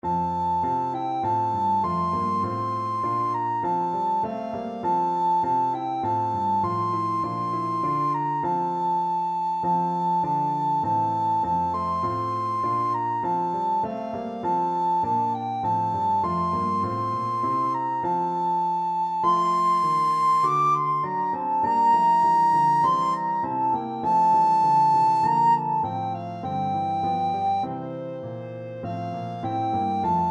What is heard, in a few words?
recorder